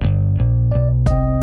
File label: Bass Walkup; Solos; BB 2HTC Samples Vol 4 Addendum